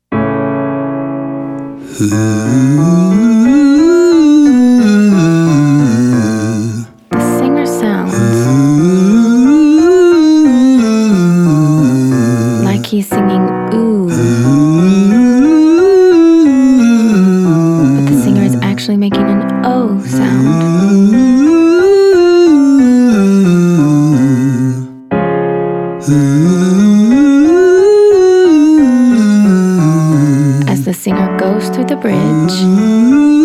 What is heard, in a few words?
Exercise tongue roll male; Singing